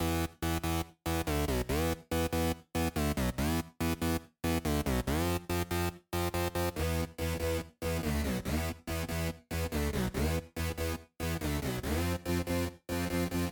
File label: crystallizer; soundtoys native effects